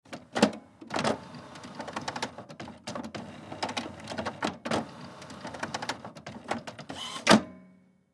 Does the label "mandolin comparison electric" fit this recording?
No